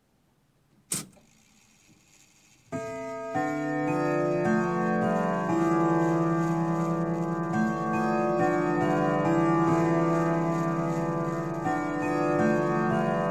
Clock, Grandfather Winchester Chime - Strike Fi; Strike Five O'clock; Sound Ideas 2000 Series

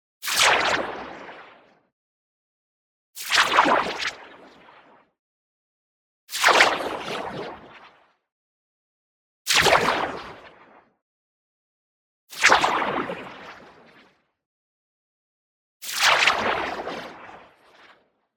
IMPACT LARGE Charged Splash; boom library; Matter

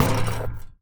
Street Ball Acrylic Basket; SFX Kit; Street Basketball

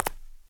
concrete; firefight

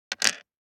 DICE on Plastic, Drop, Standard, 5 Five Dice; Samples; ArticulatedSounds; DICE Sfx Party (Extended)

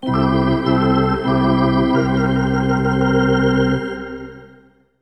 Hockey Organ

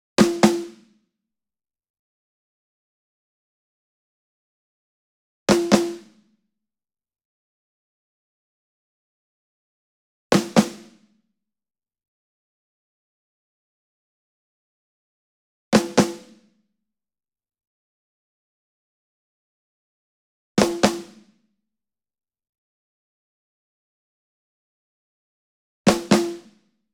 ENSEMBLE SNARE Group Double Near; boom library; Cinematic Strikes Construction Kit

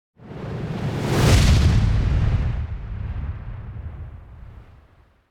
Whoosh Explosion Fly And Destroy; Hollywood Edge; sound designer's toolkit v2

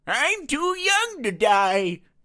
town human far; Blind Drive

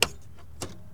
Mustang Hood Open; Automobiles

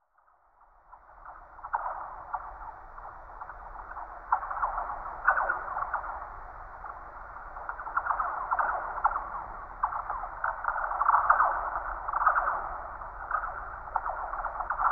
Twang; Quiet Rooms & Other Worlds

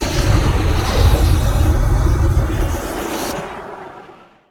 Flame Thrower, Heavy Burst; gun and weapon sounds; captain audio; fully loaded

